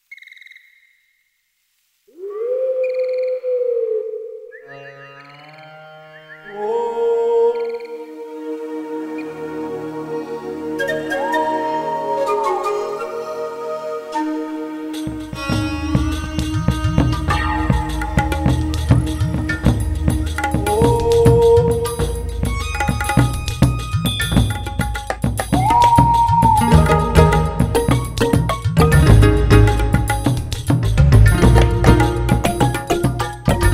udu world

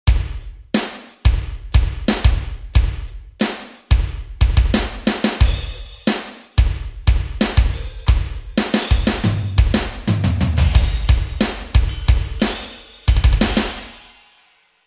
rock

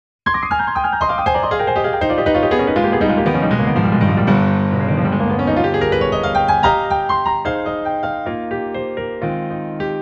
piano

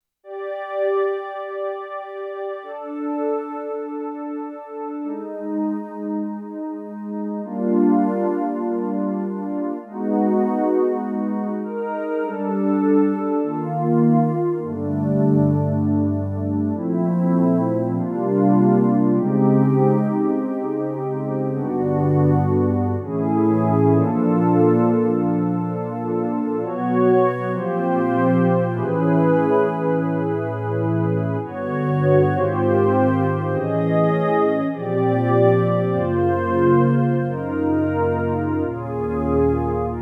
kawai k1 5ths

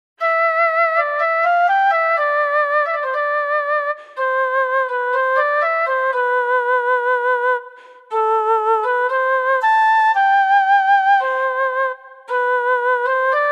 pipe

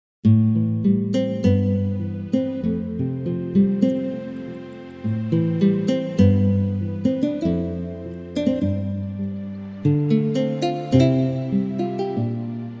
nyln mood

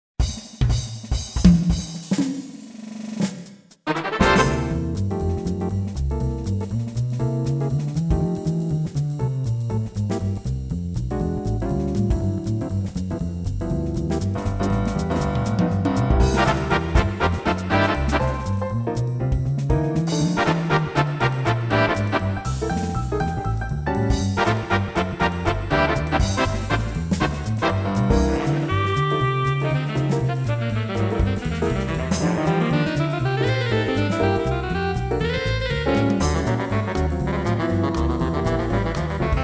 jazz

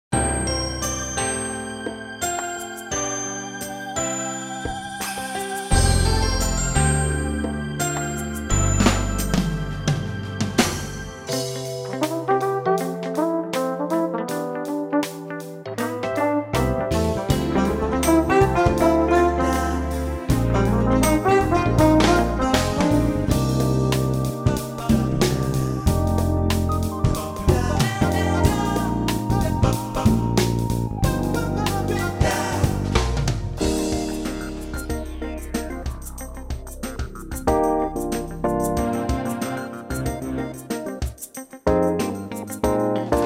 pop

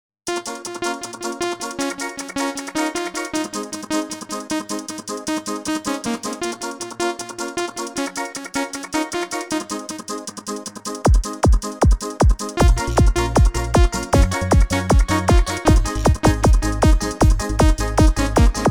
europop

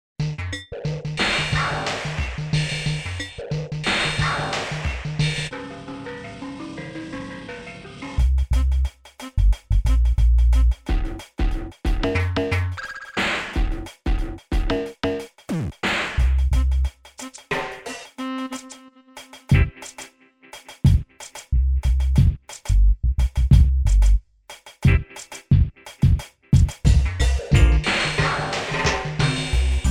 reggae

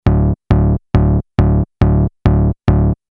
bass

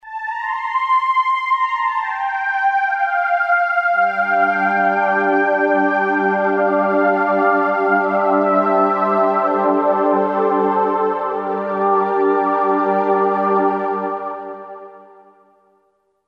synvox

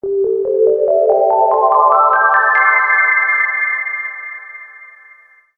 Ice drop

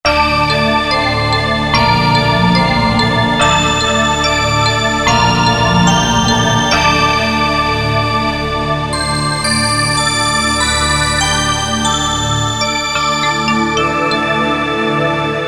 airsyn

